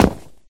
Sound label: footstep concrete land; ProSoundCollection v1 3 MONO Footsteps